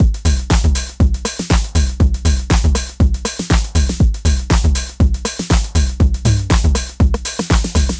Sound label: 120 Nine O Nine Drums